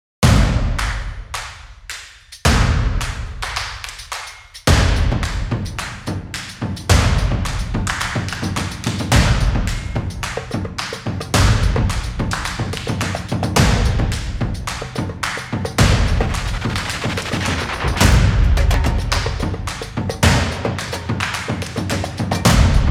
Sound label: Hybrid Percussions